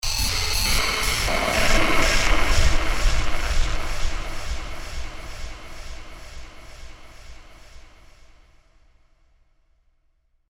Plunging sound of vessel diving underwater; Deep Sea Submerge; Descends; Sound Design

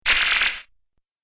Laser Alien Zap, laser gun

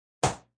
Clap Snare

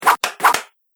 Kung Fu Two Swish Strikes; Punch And Hit Sound Effects

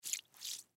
Movement Small, Small Puddle Wipe; Water Movement Small Puddle Wipe; Cleaning; Household; Blastwave

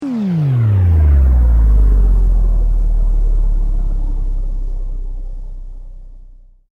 Sweep Mechanical Slow

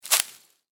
Footstep: Individual Footstep On Dry Leaves; Human; Footsteps; Stuart Duffield

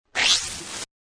Scifi Production Element Swipe Feed; Scifi Production Element - Swipe Feed. Suitable as science fiction film and game production

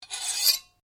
Blade Scrape Flick, Sword; Foley sounds for melee weapons; Swords; Combat

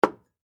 Household Hairspray Set Down In Cupboard; Bathroom

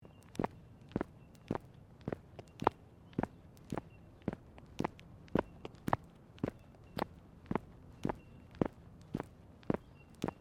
Footsteps Football Boots Concrete Sequence; Footstep Foley; Human

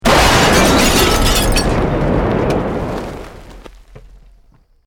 Building Collapse Medium; Created dramatic effects of buildings collapsing or breaking; Construction; SoundEffects-Foundation1

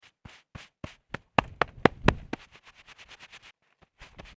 Rubbing Snow Off Clothes; Snow Sound Effects

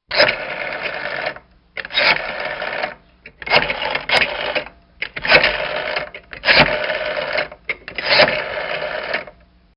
Rotary Phone Dialing

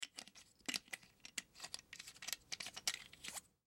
Canteen Screw On Top Army; Military; Warfare; Miscellaneous Combat; Blastwave

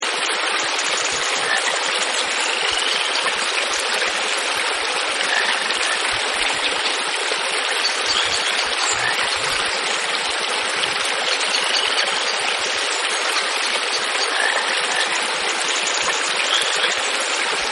Country Stream, Nature Scenes; Environmental; Partners In Rhyme

